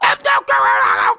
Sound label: Fight; Waterski